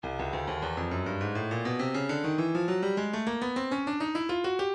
breakbeat 102 bpm; forward c; Stylus Breakbeat Midi Files

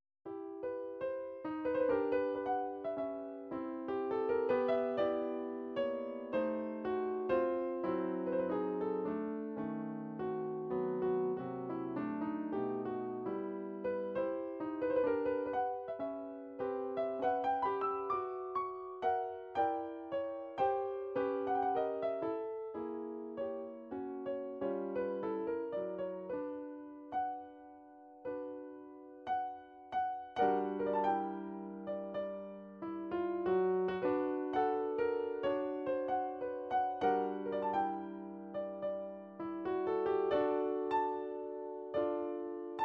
mazurka, midi; skriabin

